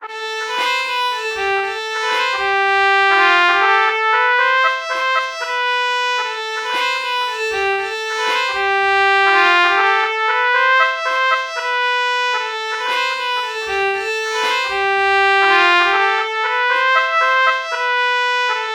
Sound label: trumpet violin melody morph